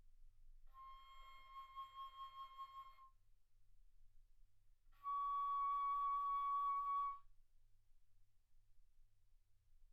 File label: Bass Flute ff C6 Db6